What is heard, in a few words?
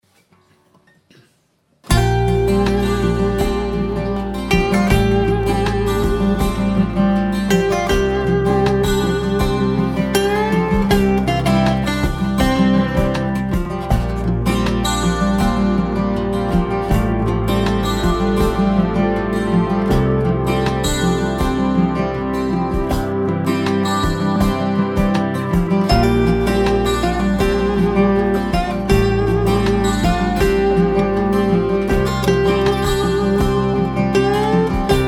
Guitar Ocean